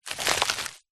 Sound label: footstep single slow sneaky dry leaves forest floor; zapsplat